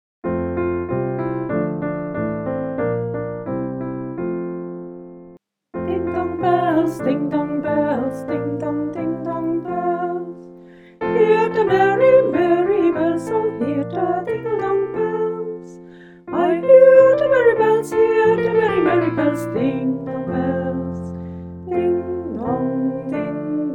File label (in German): ding dong bells klavier stimme